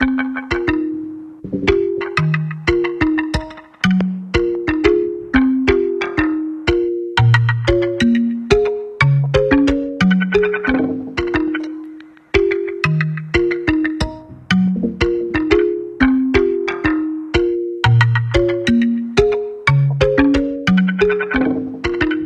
forest; Tumbleseed

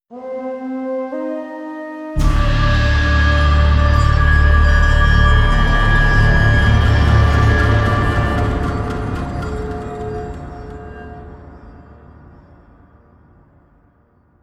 Round Start; Zombie Revelations - Shangri La

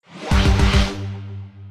Job Goal Finish

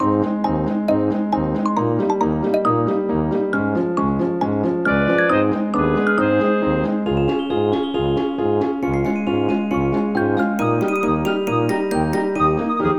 Generic Minigame; peppa pig world adventures

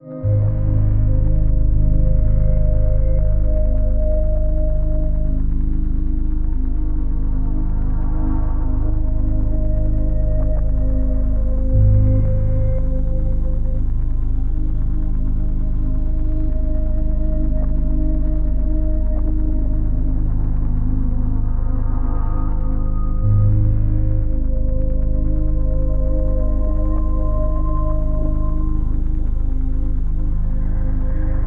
music dark room inside

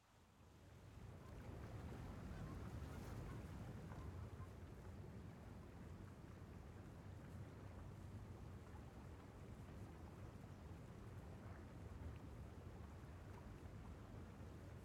Ambience Ocean Ext

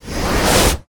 Attack Wind

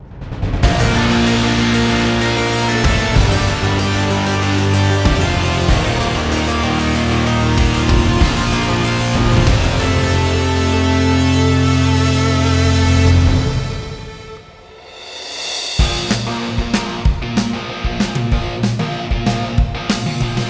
Boss Victory Intro

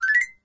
Sfx - Butterfly Active

